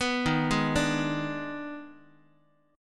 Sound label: D sharp Major 7th Suspended 4th Sharp 5th, D sharp, down, Guitar; arpeggiated; D#M7sus4#5; x,x,2,1,0,1; JGuitar